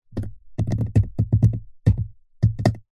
industrial adhesive paste in plastic tub drips test consistency